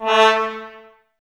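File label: brass trumpets A; Miroslav Vitous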